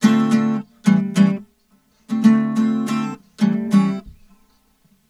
Guitar, Urban Grooves; Sonic Foundry (Sony Creative Software) - Stylus Pressure Urban Grooves on Digital Wax

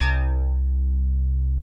Synth hits and riffs

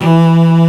solo cello; East West; Global Sounds

